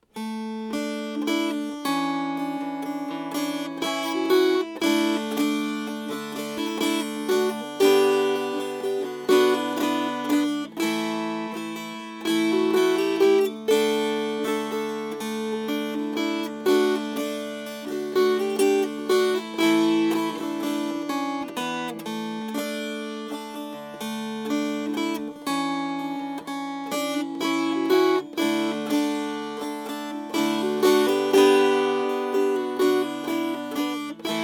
Strummed

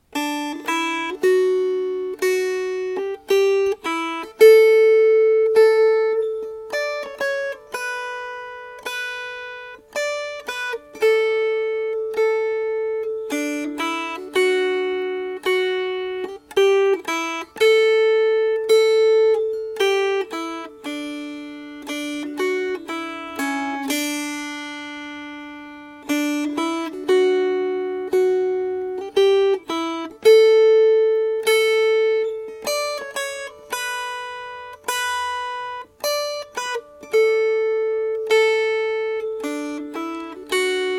Melody; Michael Turner's Waltz

